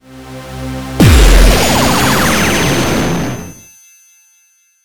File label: ui trophy open